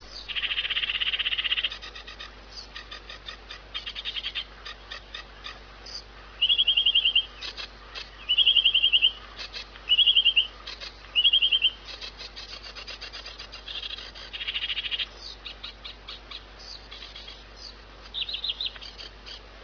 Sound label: sedge